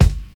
present kick sample g key, Royality free bass drum tuned to the G note. Loudest frequency: 405Hz